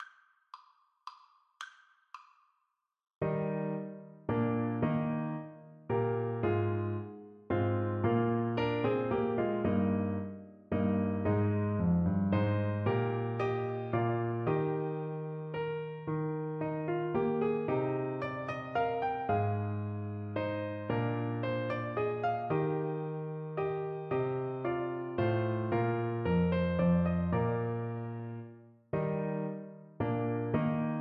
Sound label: trumpet